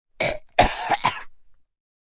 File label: Choke Gag